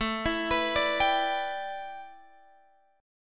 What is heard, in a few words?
A 7th Suspended 2nd Suspended 4th A, Guitar Open G; chord